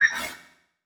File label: Transition Bell Hit; Adobe; Production Element